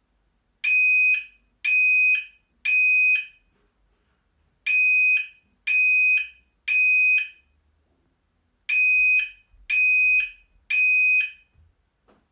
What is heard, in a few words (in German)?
Bosch Smart Home Rauchmelder Alarm; Bosch Smart Home Rauchmelder TRX1 TIF Alarm